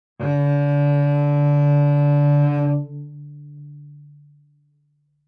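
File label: Cello arco fortissimo sul C Db3; MIS Pitches - 2014; Strings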